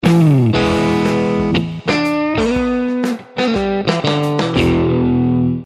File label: Crunch DS1 JCM900